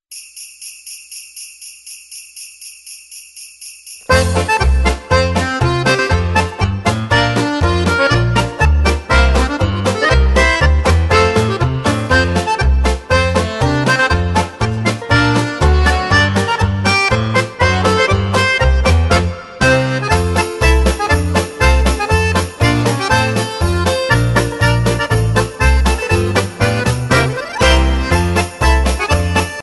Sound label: Jingle Bells, Polka